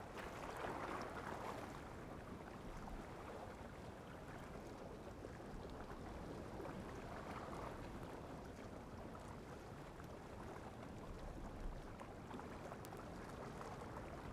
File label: Water Trickle Rocks Lo; Poetry Project